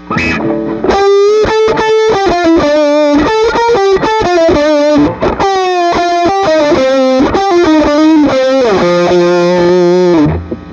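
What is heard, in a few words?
90s Pedal 5, guitar, Treble Booster; Guild 1984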